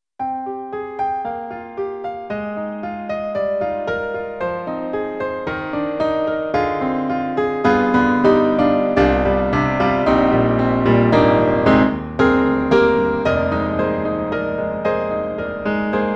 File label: Bosendorfer imperial; Synthogy; Ivory1